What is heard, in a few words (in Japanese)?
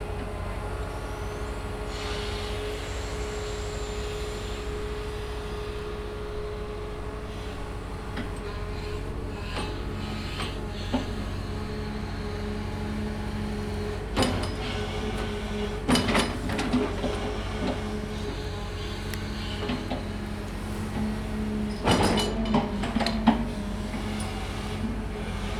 クレーンによる土起こし